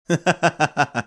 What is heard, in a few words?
chucking